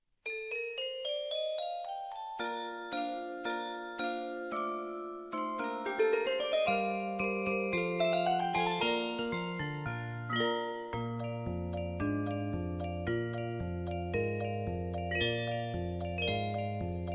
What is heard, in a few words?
motorcycle